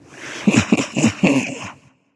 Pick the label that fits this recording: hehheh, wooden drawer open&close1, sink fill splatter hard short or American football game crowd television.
hehheh